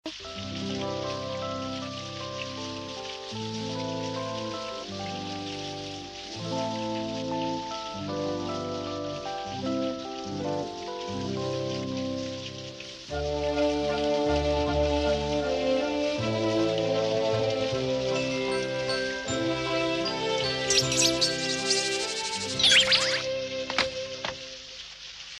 soothing bgm; old doraemon

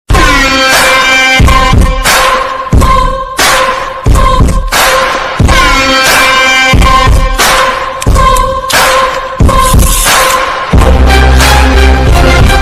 mankatha ringtone bgm

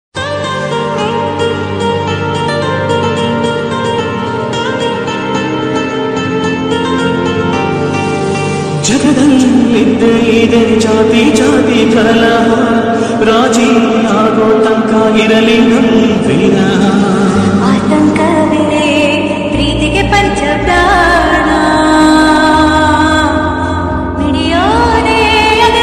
kannada ringtone love song ringtone

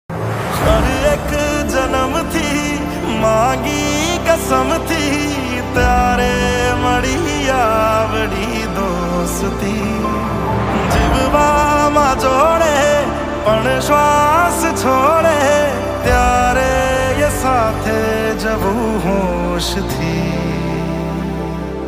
gujarati song; gujarati music

